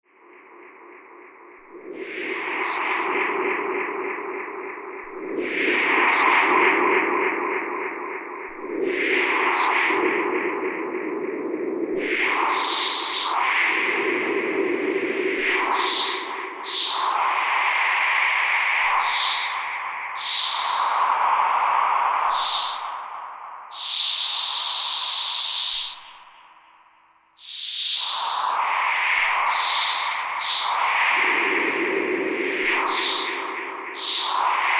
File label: Morph Controller